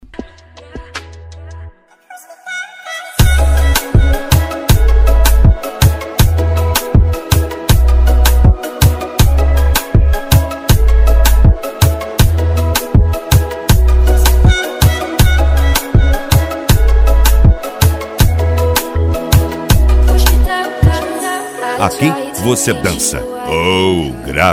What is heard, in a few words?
sound car reggaeton; cd volks sound car